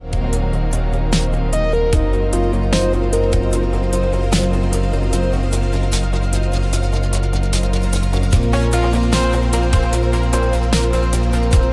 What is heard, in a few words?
space exploration